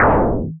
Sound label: Metalic Thump